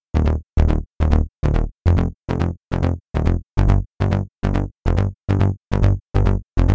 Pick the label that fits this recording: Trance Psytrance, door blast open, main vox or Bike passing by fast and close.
Trance Psytrance